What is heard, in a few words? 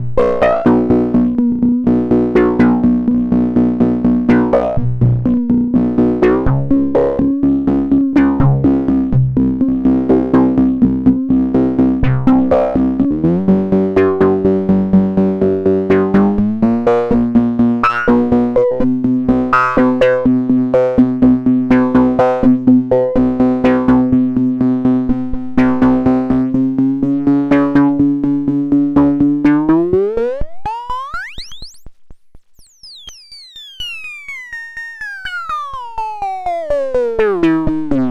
Voice Hard Sync